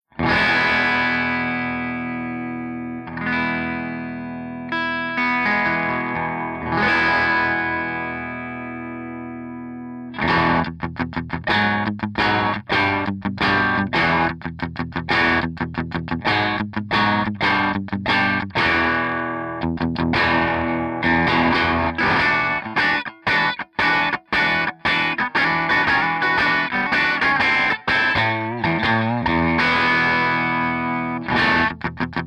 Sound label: AC30 BRILLIANT P90